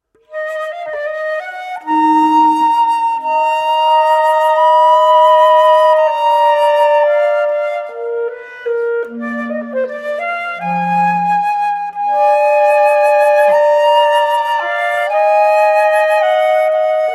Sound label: Larghetto From Trio in Eb